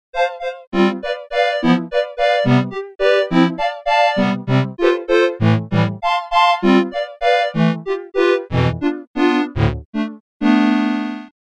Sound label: Space Circus; Cosmology